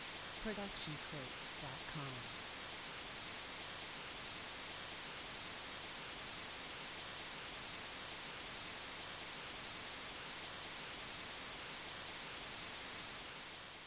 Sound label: TV White Noise; SoundsCrate